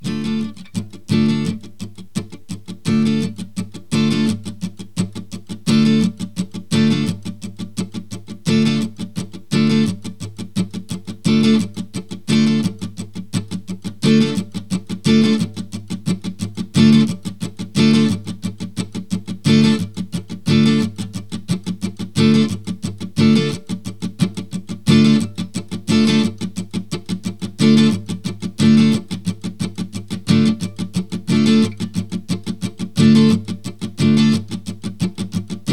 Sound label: Dm drone, harmonica